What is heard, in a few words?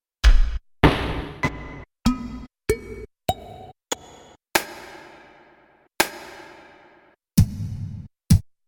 KOPRO+ Gate Kit